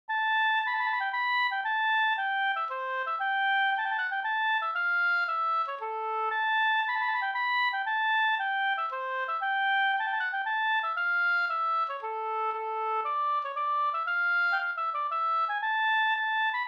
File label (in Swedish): polska Bodapolska